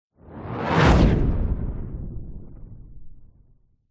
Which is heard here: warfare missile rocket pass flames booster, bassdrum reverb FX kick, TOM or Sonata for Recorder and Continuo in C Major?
warfare missile rocket pass flames booster